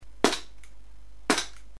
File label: silenced, maruzen p99